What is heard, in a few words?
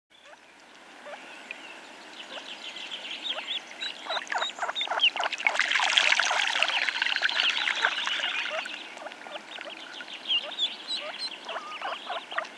Tufted Duck